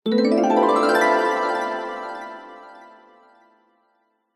fantasy magic glissando warm harp mallet bright ascending; zapsplat